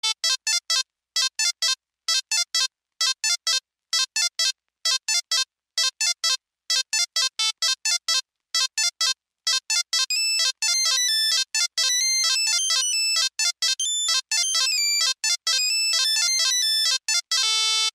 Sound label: nokia hurdy gurdy